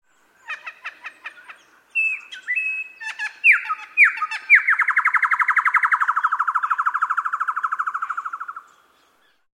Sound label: running stream